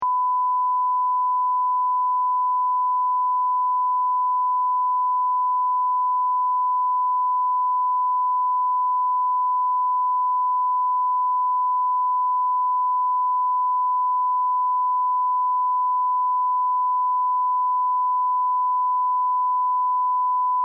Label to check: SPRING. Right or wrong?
Wrong